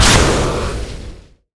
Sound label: chain frost impact; goo goo gaga boss; hero